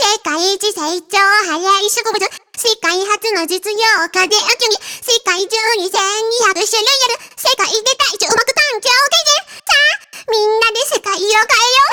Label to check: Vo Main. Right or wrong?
Right